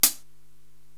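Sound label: Hi Hat Closed; Korg TR-Rack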